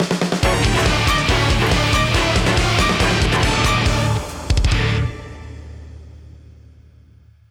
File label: Battle (Victory); paper mario the thousand year door complete; Bonetail